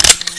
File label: ar20 mag out, weapons; svencoop